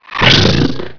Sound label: Big Spider Snarl; Playable Tutorial Level; TR2